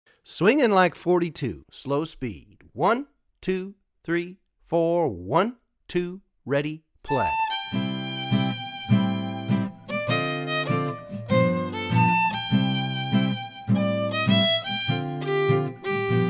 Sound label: Melody Slow, swing jazz; Swing Jazz Violin with Hot-Club Rhythm